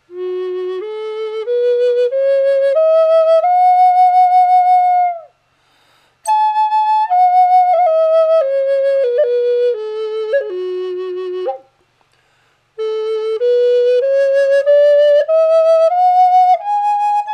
amazique f sharp dry, flutes

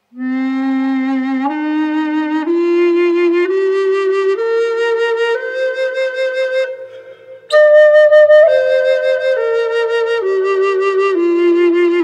bass c m birds eye inlaid wet, flutes

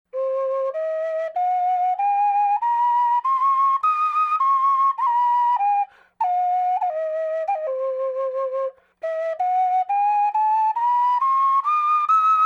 cm backpack flute dry; flutes; Laughing Crow

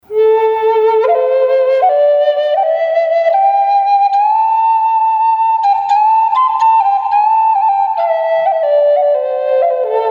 a minor reverb, flutes

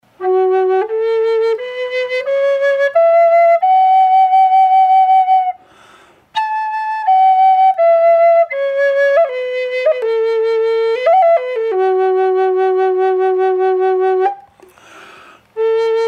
F sharp m dry, flute

